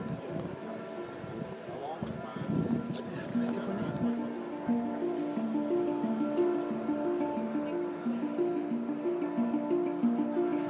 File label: steel drum; fuera de la catedral de girona